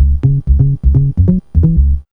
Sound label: B-LOOP